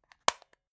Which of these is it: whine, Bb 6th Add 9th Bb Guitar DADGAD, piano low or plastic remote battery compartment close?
plastic remote battery compartment close